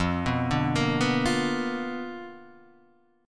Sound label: B Minor Major 7th Flat 5th, chord